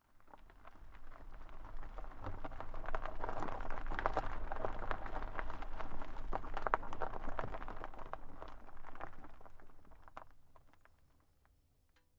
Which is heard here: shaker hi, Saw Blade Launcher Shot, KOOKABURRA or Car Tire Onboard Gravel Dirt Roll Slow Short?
Car Tire Onboard Gravel Dirt Roll Slow Short